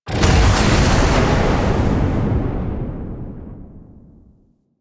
Lotus Hand Fire; Warframe; Weapons; Duviri

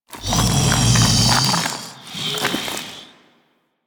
Venor Breath; Warframe; Infested Eris; Ambience

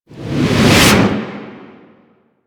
Environment Explosion; Proj D; Mission Four; Warframe; The New War

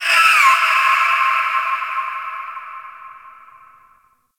Ocean Underwater Critter Small; Ambience; Warframe; Grineer Ocean

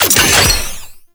Shields Up Battery Pickup; Tau; Twelve Minute War

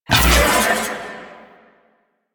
Proj Fully Charged Whoosh; Warframe; The New War; Quests; Weapons; Omicrus

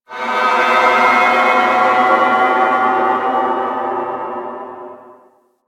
Landscape Orb Crater Drone, Ambience; Warframe; Venus Open World; One Offs

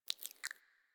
foley mouth move; Enemies; Warframe; Catbrow

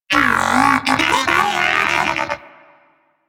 Moa Pet player down; Warframe; Tenacious

